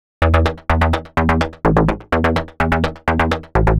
126 E A BASS, Funky Underground bass loops; Loopmasters; Funky Underground Vol 2

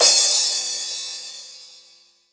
Crash, Cymbals; Mutekki Media; Vengeance Electroshock Vol.2

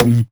Percussive

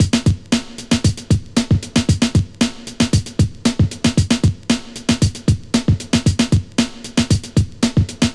115 Knockers, Breaks; loops; 115 BPM; Loopmasters; Jon Carter & Alex Blanco; Block Party Essentials

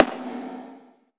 Perc, Dubstep One-Shot Drums

